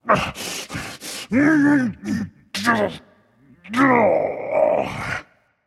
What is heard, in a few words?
grunt lift; fenrir